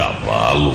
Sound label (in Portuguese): cavalo